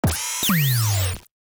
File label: swing up start laser; LG Wing 5G Verizon